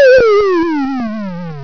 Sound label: game end; EFFECTS